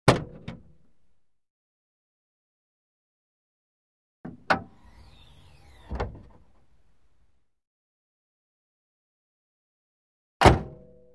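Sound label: Sound of hood opening and closing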